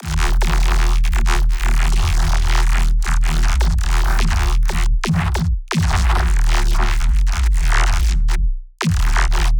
granular neuro, bass

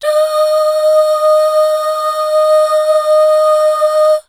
DUUH, BACKGROUND

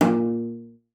CELLO FINGER A#2; Xsample VOL-5

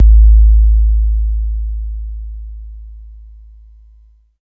808 KIK; East West - Phat & Phunky CD2; 90's Sample CDs

